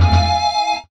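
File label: guitar (harmonic); Sonic Foundry (Sony Creative Software); Ugly Remnants VOL-2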